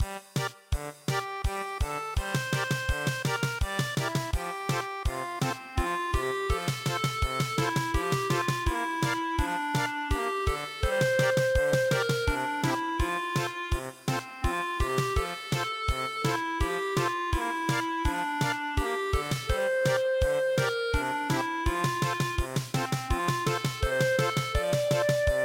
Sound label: Swiss German Polka; traditional